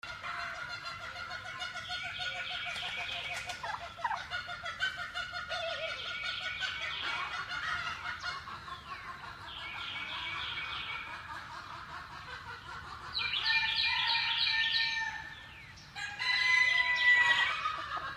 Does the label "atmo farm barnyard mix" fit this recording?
Yes